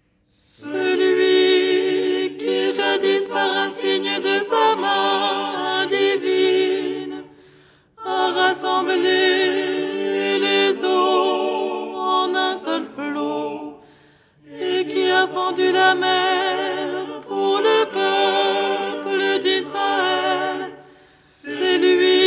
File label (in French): alto; ton 3